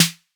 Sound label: 808 snare; SNARES; Wizoo - Platinum 24 Electronic